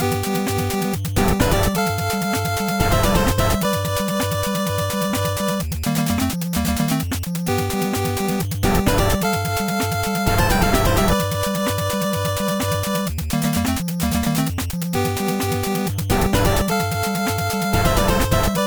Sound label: Area 1 DPCM; Blaster Master